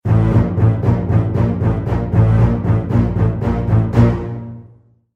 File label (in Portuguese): alerta; filme tubarao